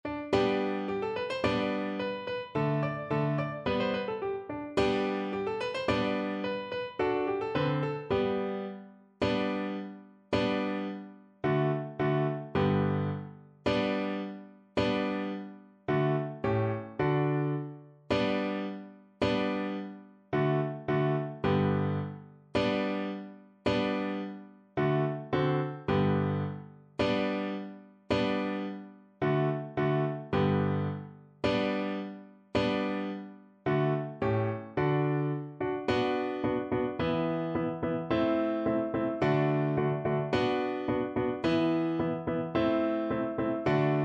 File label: vn pf; weber; chor strzelcow